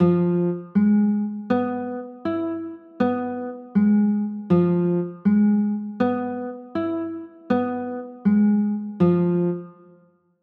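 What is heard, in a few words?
G 13 b9 chord, guitar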